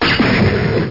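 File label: lightning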